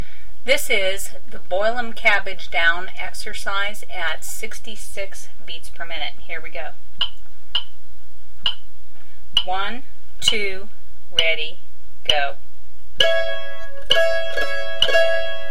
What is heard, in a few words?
Exercise 66bpm, mandolin